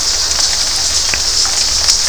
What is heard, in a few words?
RAIN THUNDER; Rubber Chicken Software Sample Bank